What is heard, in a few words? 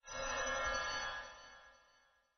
Whisp